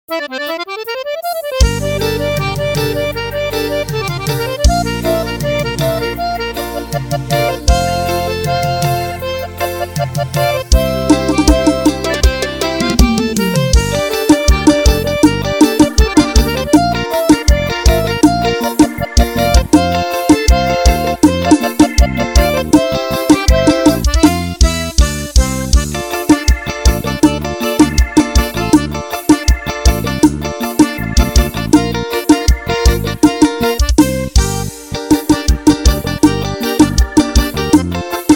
teclado playback; playback demo

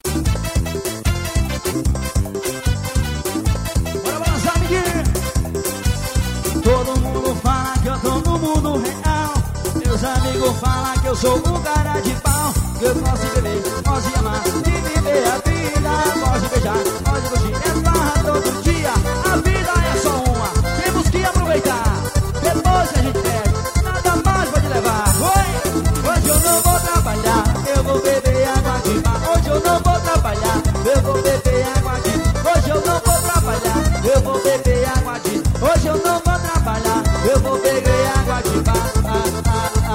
forró

